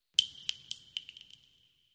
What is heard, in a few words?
Shell Casings Single